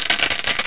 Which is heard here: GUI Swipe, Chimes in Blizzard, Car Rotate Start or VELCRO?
VELCRO